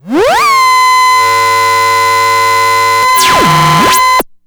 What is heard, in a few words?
Ring Mod Noise, SFX, Electronic; Sonic Foundry (Sony Creative Software); Electronic Point Blank